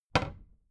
cabinet door close regular

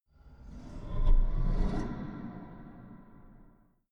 plugging impact

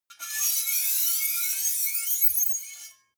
two knives large scrape squeak long deafening

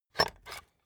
puncher squeak punch bulky

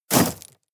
shovel dig impact hard

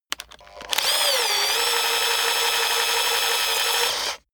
servo cry rattle on off

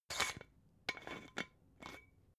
glass bottle massive open extra slow

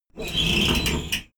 train intercity train hydraulic slide door open

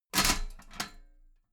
metal ladder small handle unfold regular

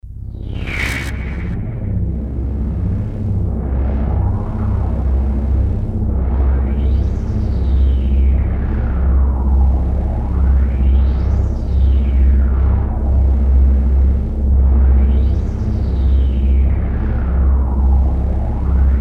deep noise distortion layer

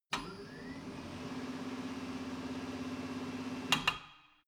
tire changing machine turning platform latch long

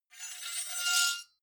sword blade screech high

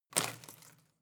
home plant leaves rustle hit

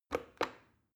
plastic button start click regular slow

